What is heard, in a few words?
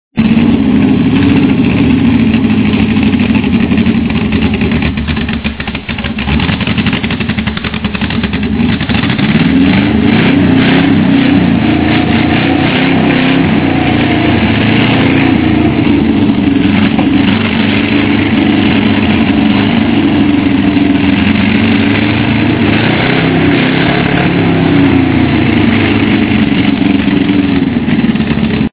rough, v8 micra; magicspanner